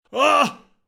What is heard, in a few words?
Pain Male